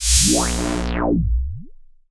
Boss Multiple Hit

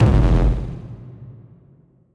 Pig strike; Super Mombo Quest

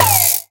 Character Hit; SAO

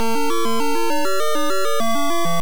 Level Clear

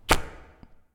Flash Coliison Ext

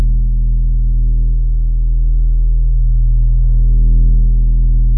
Low Elec loop

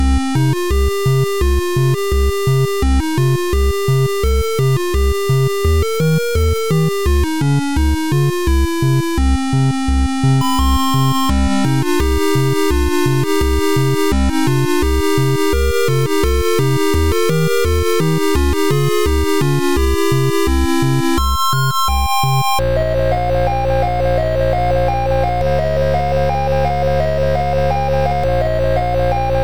Soft Tune; Adventure in the Tower of Flight

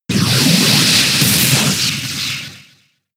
Discharge; Pokémon SFX Gen 6 Attack Moves; XY ORAS

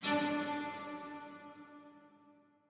chime; chime sharp

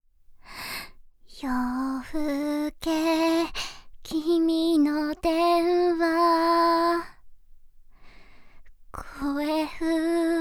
Song Acappella Jp; AI The Somnium Files Nirvana Initiative

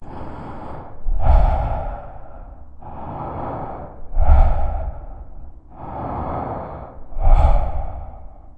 Respiration Normal; Puddle; Coprs; puddle plus ios gamerip 2018

